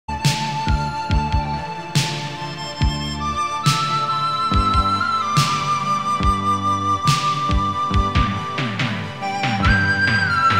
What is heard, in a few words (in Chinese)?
广告片音乐